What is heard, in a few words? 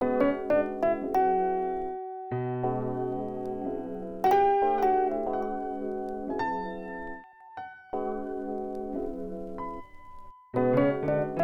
Melody